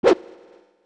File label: Sword